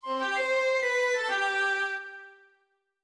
music

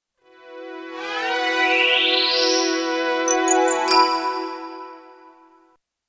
tune; sherstons

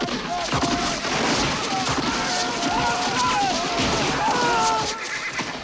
Melee; Waterloo

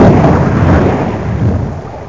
explo

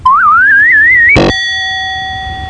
ding; PSL Monthly Shareware CD-ROM (Public Software Library) (January 1994)

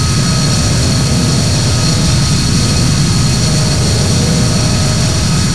dog loop; Zaero

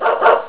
BARK; Story Maker

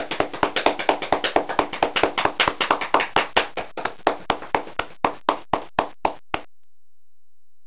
2 CLAPERS; Spanish Net; CAFE

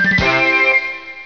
Bonus points; Deco 1.0

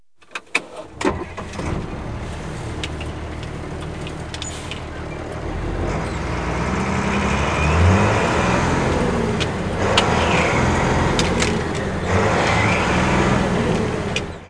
Dump Truck; KABOOM! - Wild and Wacky Sound Software; City Life; Trucks & Tractors

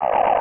Drain; Starbound II

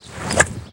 ROUGH; Jack

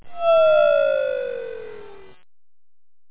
flush

